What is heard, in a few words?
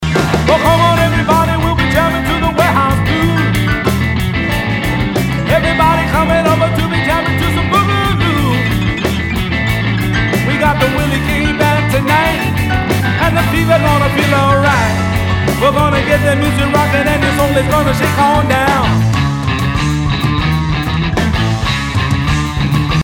Warehouse Blues